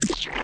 SPLAT, CARTOON - PAINT FIGHT GLOP; Sound Ideas

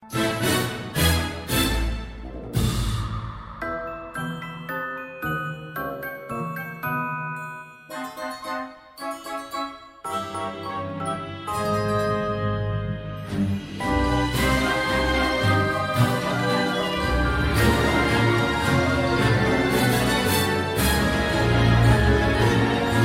Nightfall Loop; Paladins

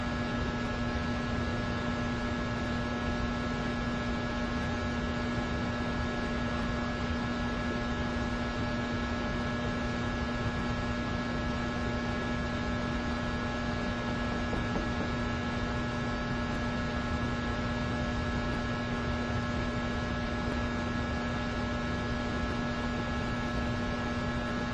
Light Ambience; backrooms; Level 0

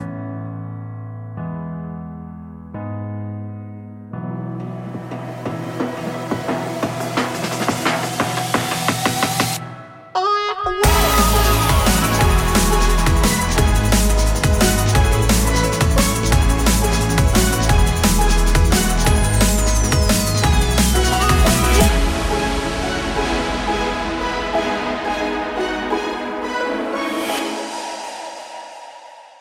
Epic Countdown soundtrack; dungeon quest roblox

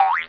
BOINK, CARTOON - BOINK; Sound Ideas

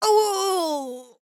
screams; Overwatch

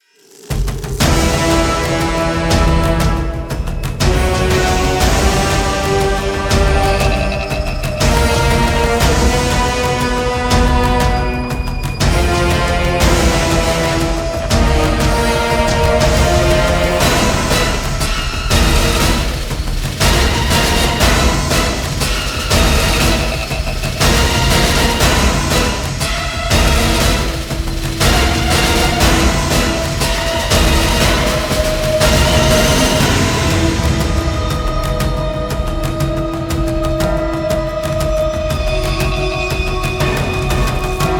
Pumpkin Boss soundtrack; dungeon quest roblox

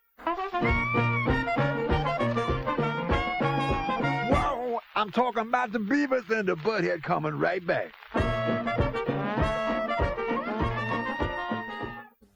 Dixieland Jazz; beavis and butthead

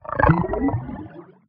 claws; stray; Zurg

